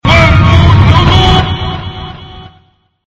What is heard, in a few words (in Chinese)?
fish quanpin zhadan; monkeyfish